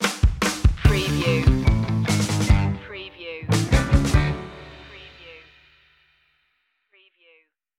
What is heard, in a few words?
driving fast groove stinger